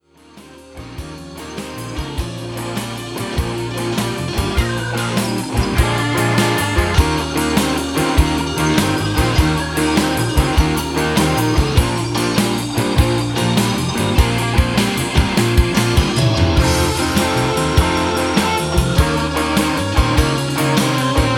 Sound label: South Side Blues in Bb